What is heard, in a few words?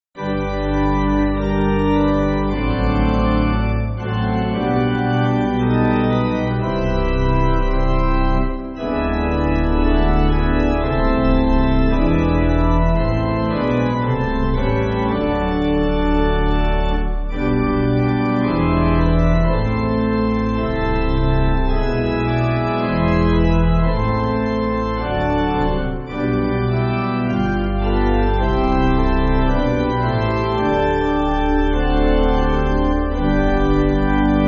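Pipe, Pipe Organ, Backing Files for Churches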